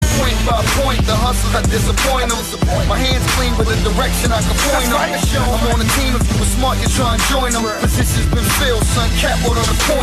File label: Blow